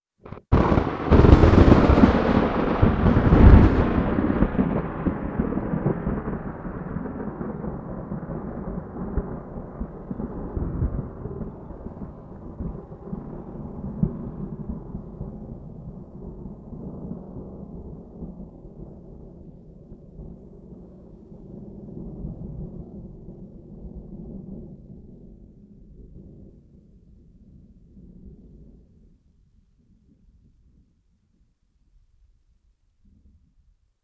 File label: Rain High